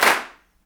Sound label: CLAPS, Africa Handclaps